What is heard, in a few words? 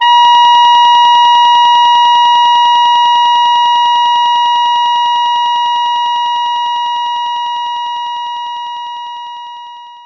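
Clarinet A sharp 5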